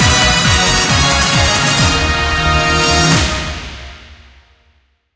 epic win end; rome

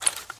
boost window open; rage of the seas

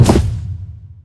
sym Expand; riches of midgard

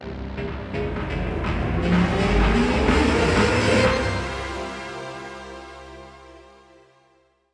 free spin music intro; spinsane

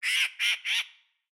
crow; the wolfs night

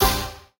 scatter short; spinsane

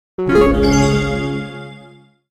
progress; silverback

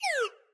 pop Close; willyshotchillies

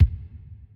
reel Bounce; golden grimoire

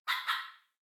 amb Dog Oct Up; willyshotchillies

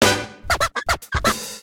button Continue Free Spins Intro; scruffy duck

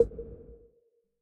reelStop; jewel fruits

